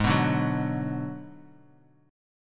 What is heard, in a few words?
Ab Minor 7th Flat 5th, Bass Standard; strummed; JGuitar